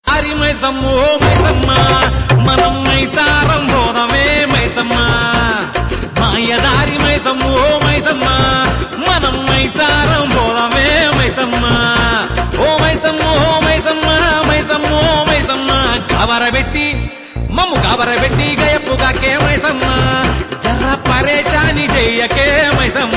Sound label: Telangana Folk